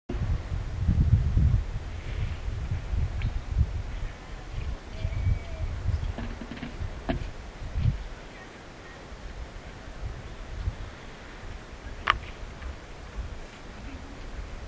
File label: Drums EVP